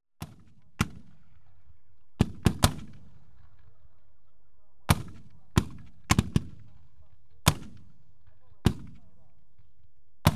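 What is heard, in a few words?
Warfare, 81mm Medium Mortar Firing; BBC Sound Effects Library; Explosions Guns Alarms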